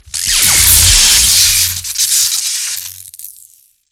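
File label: destroy transformer large explosion; Explosions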